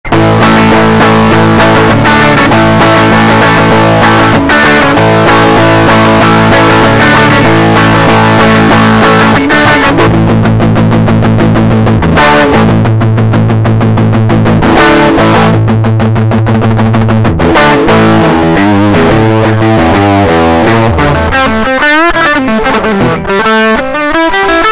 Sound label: axe wet; soft-clipping, acting more like an overdrive than a compressor; Reaper digital audio workstation; after